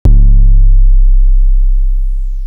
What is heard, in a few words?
808's